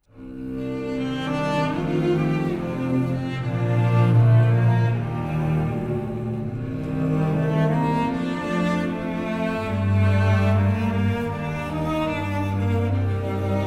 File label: Impression